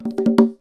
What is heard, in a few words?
Fill, Conga; musicradar